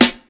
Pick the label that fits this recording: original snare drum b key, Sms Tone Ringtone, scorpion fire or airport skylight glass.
original snare drum b key